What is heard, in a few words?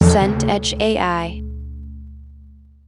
timpani hit